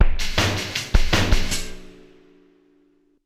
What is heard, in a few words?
drums